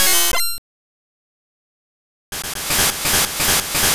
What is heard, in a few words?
Steel Wing; attack moves; pokemon; gen 2; gsc